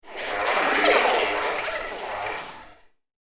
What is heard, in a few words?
Alien Droid Comm Bots, robot; Robot Sound Effects; science fiction sound effect